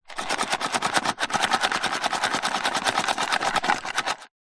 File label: household furniture wooden drawer removed small shake items rattle; zapsplat